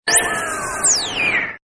radio dial; Buttons; Sound Effects:Multimedia

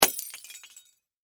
Impact Light Bulb Smash Glass; Lights; Household

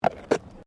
Tram Horse Drawn Horse Step Gravel; Horse drawn tramcar; Carriages (Sound Effects:Vehicles)

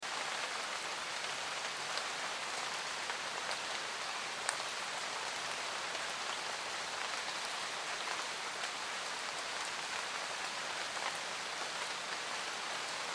Forest Rain Medium Loop; Nature; Ambience; Blastwave

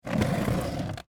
Wood Chair Slide, Drag; Foley; Wood Sound Effects; Wood (Sound Effects:Materials); BlastWave

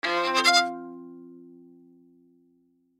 Violin Play Short, Violin Play Short Stabs; String Sound Effects

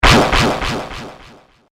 Laser Zap, Laser Gun

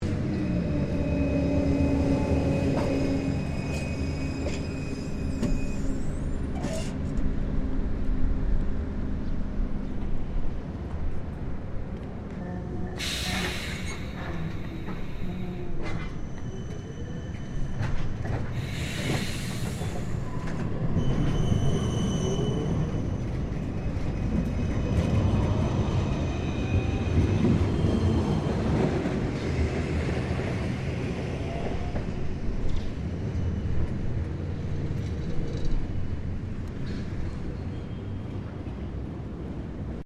Tram Melbourne C Class Scheduled Stop; Various Melbourne trams; Streetcars; Sound Effects:Vehicles

